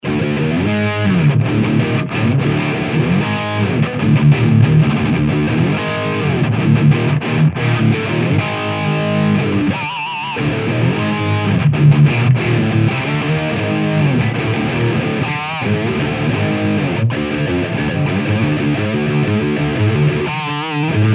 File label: Full Dist; JFET